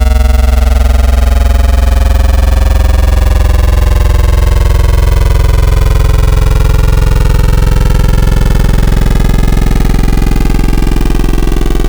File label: Vocal; ESW Vocal - Origami